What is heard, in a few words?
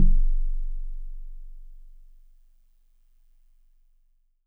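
Celeste DX100 F#1; Pristine Celeste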